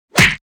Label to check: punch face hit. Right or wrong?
Right